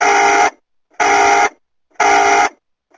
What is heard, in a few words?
alarm tone; baresip